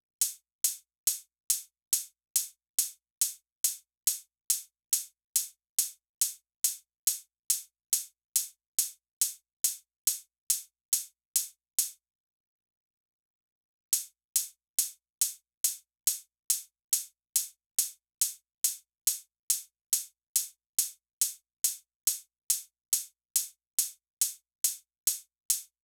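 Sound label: drum Loop 140BPM overhead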